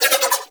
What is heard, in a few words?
Nunchaku Prime Spin Whoosh; Warframe; Tenno